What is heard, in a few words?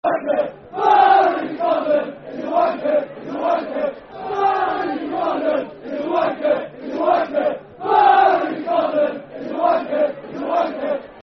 Football Chant, Darlington; Barry Conlon Is A Wanker; Darlington Dfc